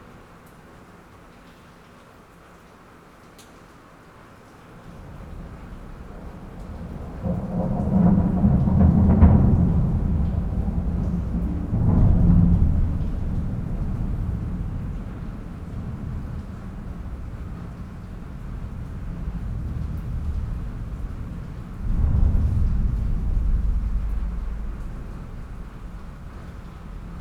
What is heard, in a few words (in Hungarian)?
mennydörgés hangja a csimpánzok szállásából; pecsi zoo 2017